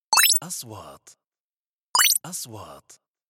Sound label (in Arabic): نغمة نقره الكترونية, transitions